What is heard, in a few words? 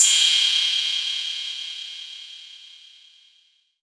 Crash, Cymbals; Vengeance Samples